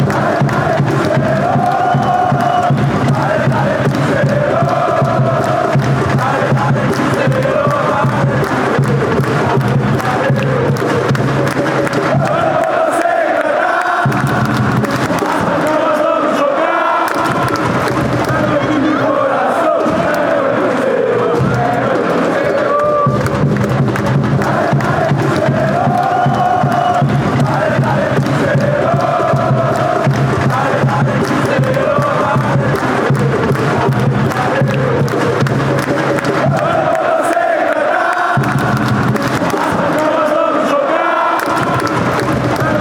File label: hoje eu vou torcer e cantar fan chants; cruzeiro